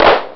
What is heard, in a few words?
dong; puzzomania